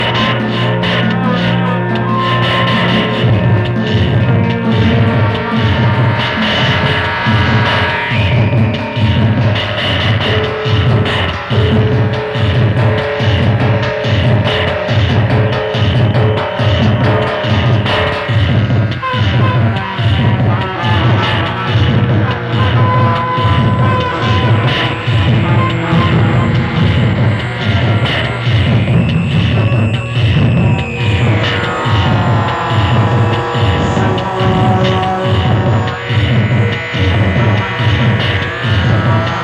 Tibetan Bowls Signaling

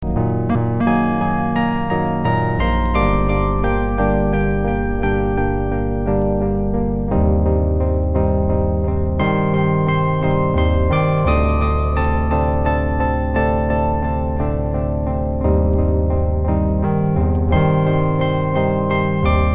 Simple Chord Prog

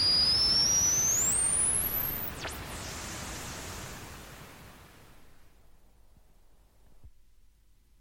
Saw Noise

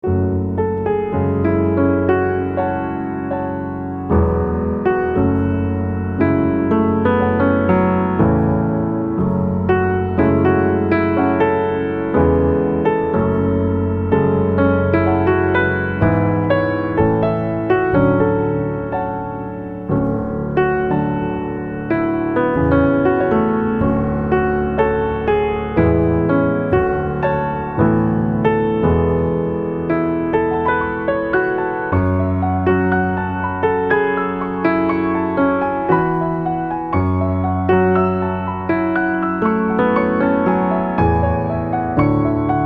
Piano Arrangement

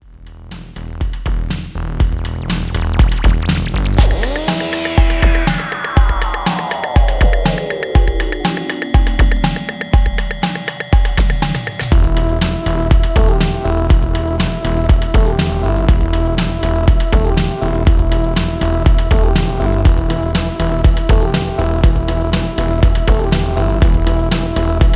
Low Red (Instrumental); Italo-Pop

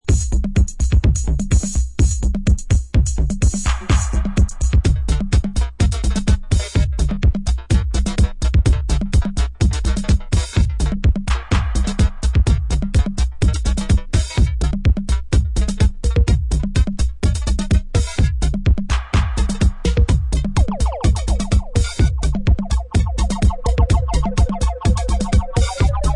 broken hihat machine

